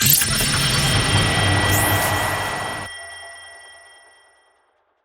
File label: FX; LiLA'c Records PsyTrance Sounds 01